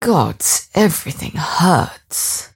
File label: move pain; dota; mirana